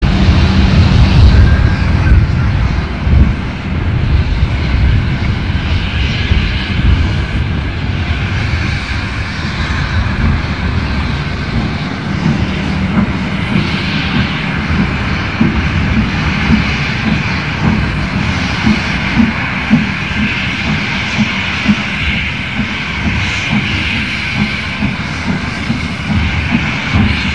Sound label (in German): Zischen, Eisenbahn; alsdorf